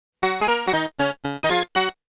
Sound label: Round Clear Music; Mappy; Namco Classic Collection Vol.1; arcade